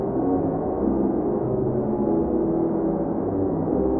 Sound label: Sample n Hold Verb Loops, sparse soundscape; SnH Verbs